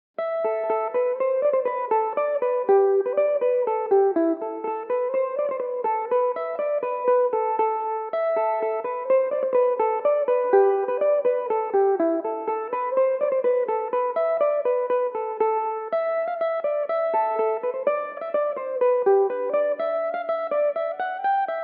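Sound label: A dorian, irish mandolin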